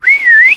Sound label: whistle; barney; e3 street; hl2